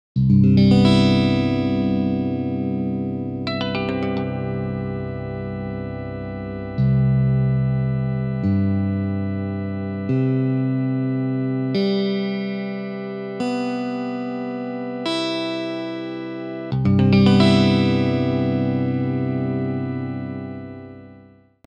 Crowd CGCGBE; crowdsourced tunings; ragajunglism

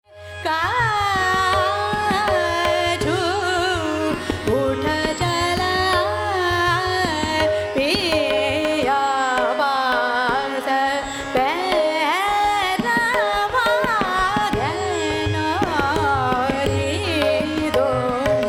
Raisa Kanada

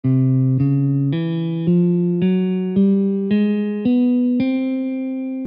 hungarian gypsy; global scales

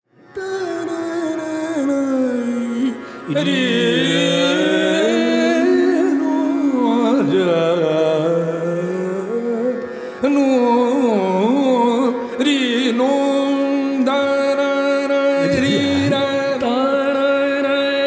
Abhogi

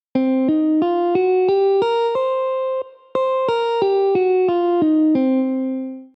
Blues Minor; scales; ragajunglism

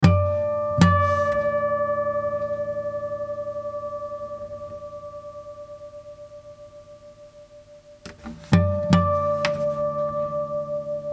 beating

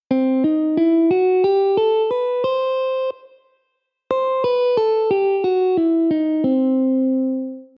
Lydian Sharp2; scales

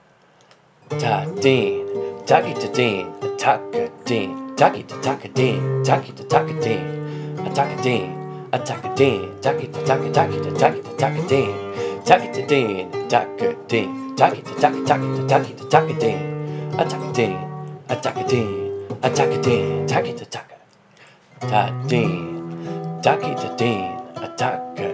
Konnakol rumba; flamen konnakol

